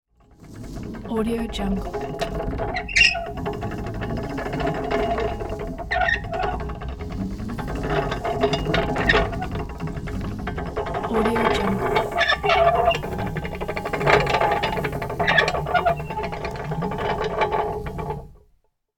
antique pulley system with manual rattly chain and winch